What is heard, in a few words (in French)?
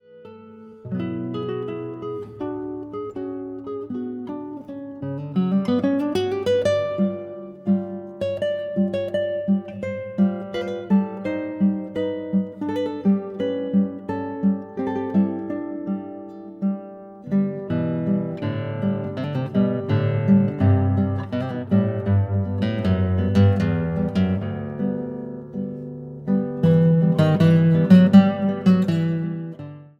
Chanson sans paroles